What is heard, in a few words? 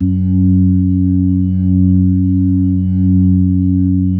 F#2S, B3, Vintage Keyboards